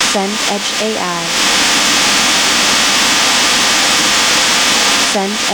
rain steady ground

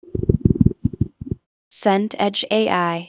stomach rumble

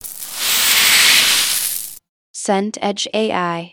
gravel slide

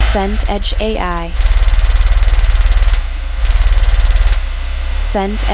tractor idle

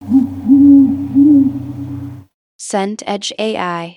owl hoot

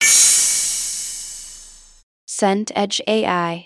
cymbal crash

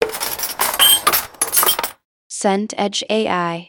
cutlery drawer

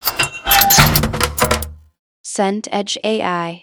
door prison bars

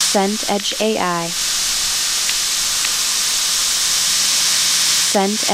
rain drizzle fine

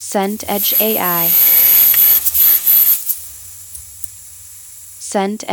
grill flame bbq

